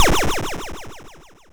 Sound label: Perc, Nord Modular Drum Samples; Yawgmoth